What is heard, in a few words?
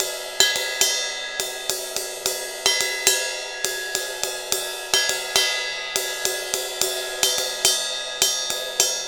Ride Cymbal Pattern